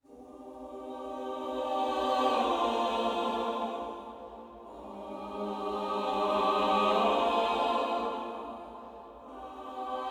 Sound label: Combat Threat 130 Cm; Combat Core5 Threat4 130 Cm 54 2; ReCore